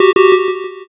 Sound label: wrong